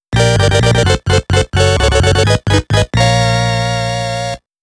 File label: Winning (Fanfare); Dream Co,.Ltd.; HuCARD Disc In Bandai Namco Games vol.1